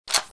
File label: clip out; qbb95; weapons